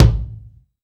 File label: Boss DR880 kick; drums